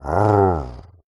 Animal Mammal Carnivore Dog Labrador Retriever Growl; animals; AdobeCC